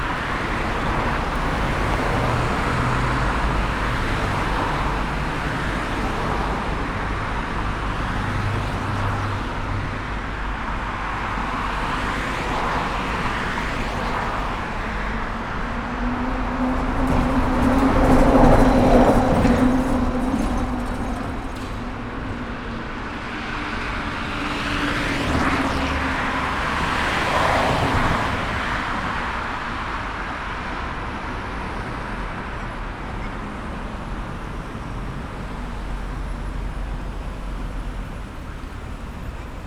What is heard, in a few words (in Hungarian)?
75-os, kivulrol, nepstadion fele, troli; dozsa gyorgy ut